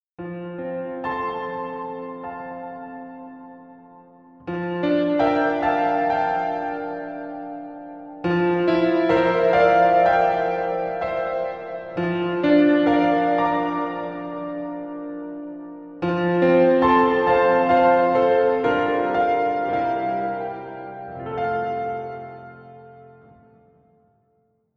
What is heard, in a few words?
ac piano stage far left